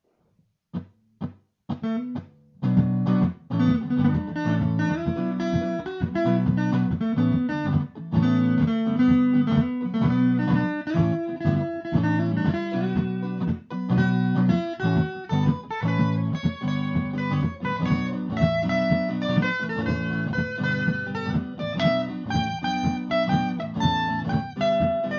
blues

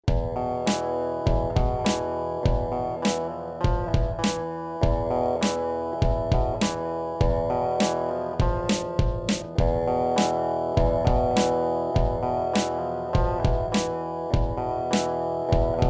New Song Loop

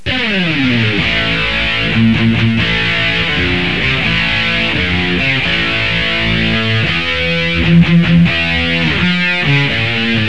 heavy march

